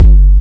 Boomy Kick; EMU MoPhatt

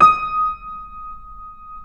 Keyboards Acoustic, D#5; USB Soundscan vol.17; AKAI

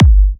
G, kicks; markus hakala kicks

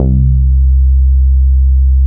P1 bass C2, vintage keyboards; SEQNTL PRO 1; Big Fish Audio; First Call

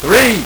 LSDj, Nintendo Gameboy; 300 Drum Machines

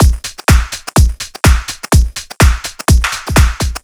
Swing Full, Drum Loops 125bpm, Nu Dance Electro; Zero-G - Soundclash; Swing Injection

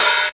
HH hh; Best Service Gigapack 2

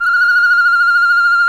BIRD, bird call; Greytsounds VOL-1; BIRD CALL FX

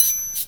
SHAKERS; Best Service; Dance Mega Drums CD2

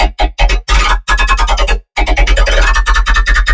Barely Tonal Dubstep Bass

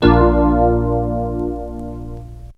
tuners

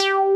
JX MOOG BS; Pro-Rec - Synthsonic

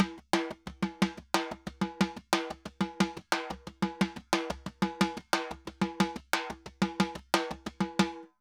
Timba, Festa Latina, Drums & Percussion; Best Service; Festa Latina VOL-1

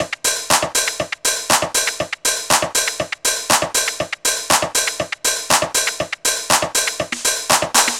Combo Hat; Sonic Foundry (Sony Creative Software); Trance NRG VOL-2; Drums; Combo Hats

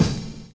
OLD SNARE; Univers Sons

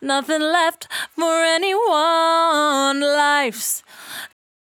68bpm D, Velvet Vocal; Zero-G

